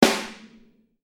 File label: instruments drum snare; maoudamashii